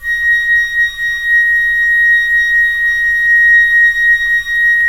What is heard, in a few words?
FLT FL, Flute; Roland - LA Composer vol. 4